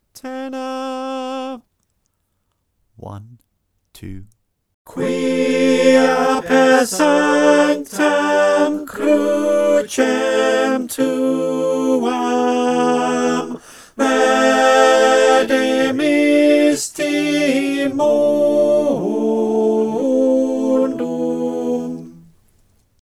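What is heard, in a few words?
tenor guide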